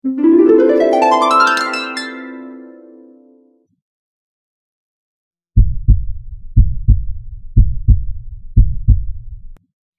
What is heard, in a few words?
Mystical Alarm Ringtone